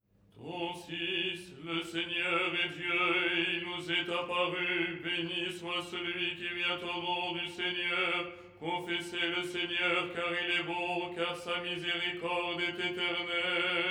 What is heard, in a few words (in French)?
tenor; " Le Seigneur est Dieu… "; Les 8 tons en français; ton 6